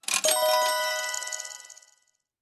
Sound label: win nice; riviera Star Desktop; Fantasma